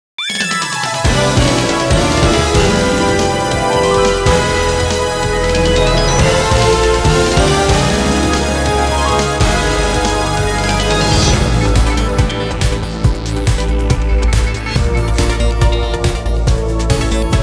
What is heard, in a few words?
Victory; Magician's Dead Next Blazing